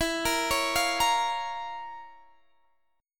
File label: F Major 7th Suspended 4th Sharp 5th E